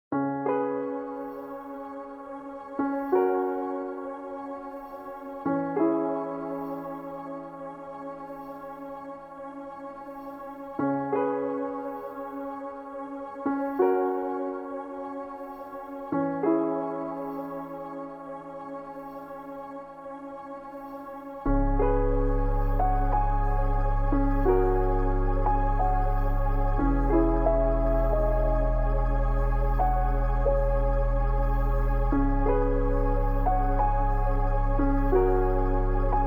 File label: relaxing, a song without words for studying